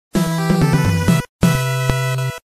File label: music life lost; batman nes